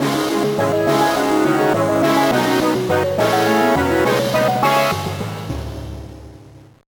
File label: Victory Jingle; Legend of Spyro The Eternal Night 2007 gba